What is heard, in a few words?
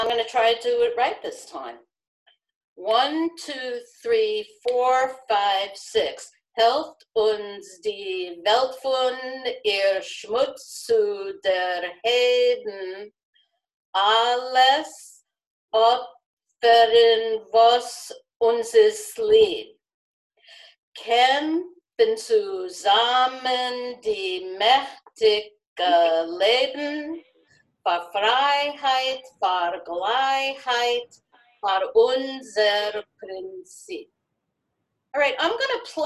altos word rhythm; word rhythms; rehearsal recordings